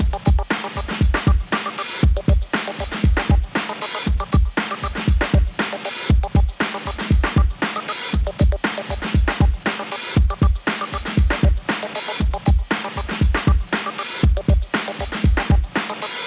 90 hiphop 118bpm DRUMS; gamerip; Hot Lava

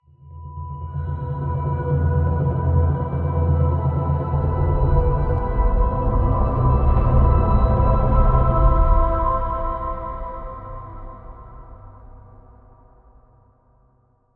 Stinger; Never Alone; Chap 06 Arm

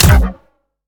Sciweap Shot Small Blaster Thermal Disintegrator

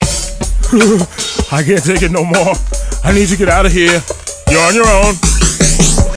Entering Cool Mode

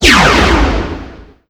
Fire Sonic boom

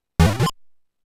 Sound of Bomb Explosion; Bakudan Haretsu Saundo

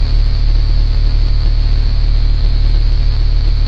Glitch Menu; Five Nights at Candys

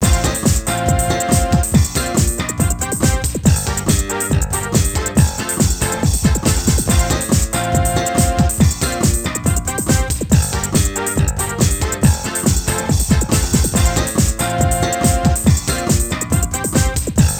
Techno Groove; Disney's Magic Artist Deluxe

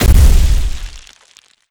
Gumball Shatter

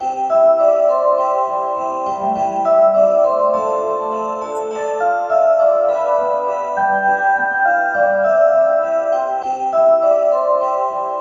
Jingle Bells ~ Ambient version; yakuza xmas sides ps2 ps3 ps4 windows xbox one gamerip 2005

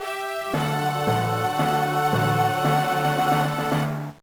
Jingle, soundtrack; tanbi musou meine liebe 2001 gba

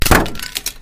Physics Plastic Box Break; Counter-Strike: Global Offensive

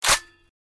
Scar Bolt release; criminality

